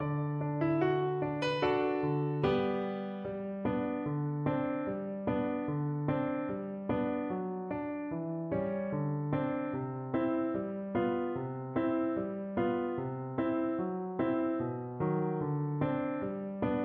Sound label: soldiers chorus, cello